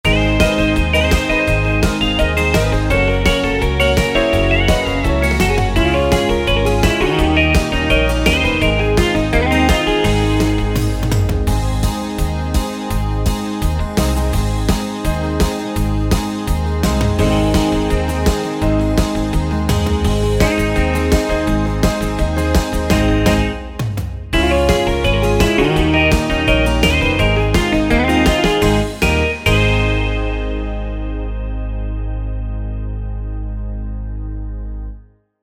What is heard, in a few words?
country strummin; wersi